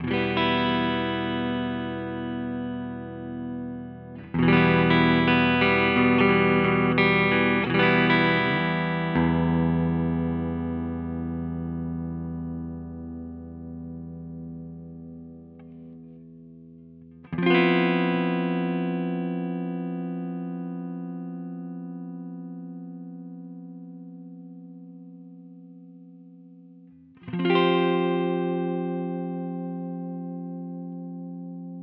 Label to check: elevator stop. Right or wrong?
Wrong